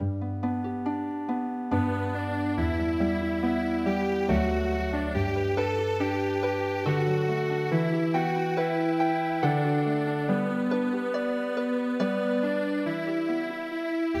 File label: Jupiter Healing Harp; Jupiter The Bringer Of Jollity